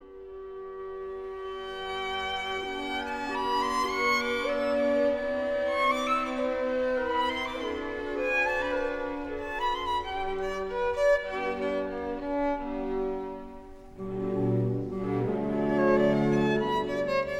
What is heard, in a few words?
Violin, Orchestre, The Orchestral Bassoon; Beethoven